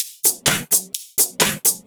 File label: Electro Loop 128BPM; Vengeance Samples